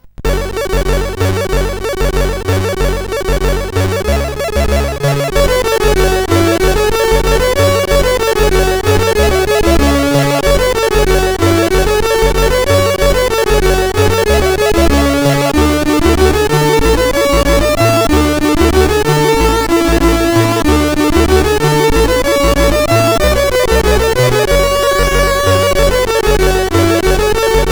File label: sid CSG8580R5; Provincia